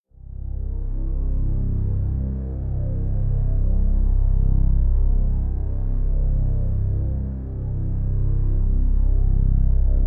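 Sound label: Drone Alternating Current (C); Brand X Music; Brand X Music Catalogue - Editor's Essentials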